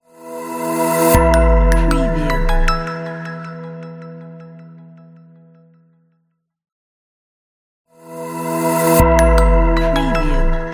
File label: marketing logo